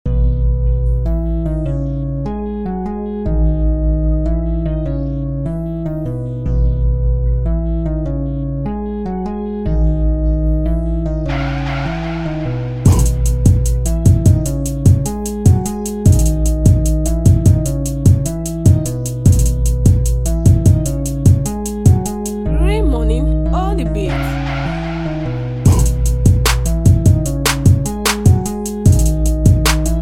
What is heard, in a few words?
Trap beat